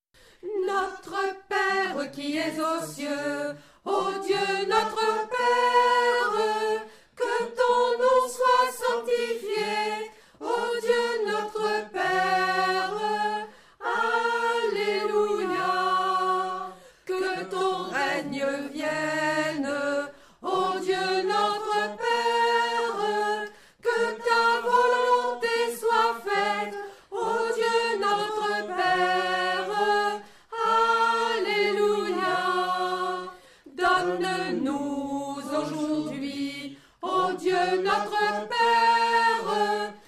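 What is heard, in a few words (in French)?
Notre Père Burkina Fasso Basse, chœur; Notre Père du Burkina Fasso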